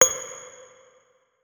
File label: notes, guitar; Hideout Furniture